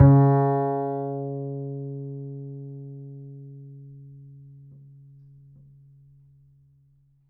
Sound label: Upright Acoustic Bass; 1ST POSITION; Larry Seyer